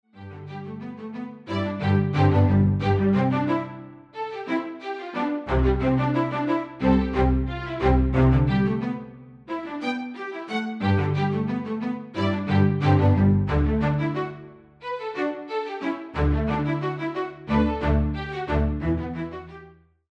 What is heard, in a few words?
Simple Strings